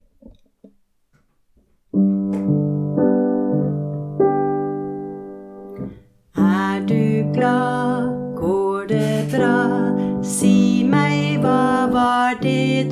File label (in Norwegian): kafesang; mottaksboka; sanger til mottaksboka